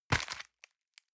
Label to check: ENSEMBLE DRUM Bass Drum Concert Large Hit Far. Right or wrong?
Wrong